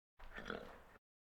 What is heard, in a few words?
Animal effects snorts grunts farm ambience